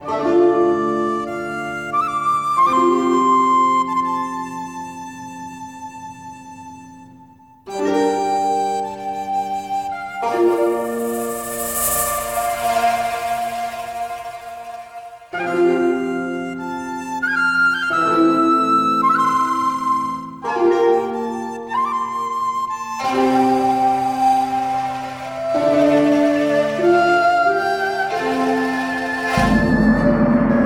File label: music; Panda